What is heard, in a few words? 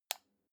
light switch on soft